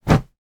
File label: household baby blanket thrown into cot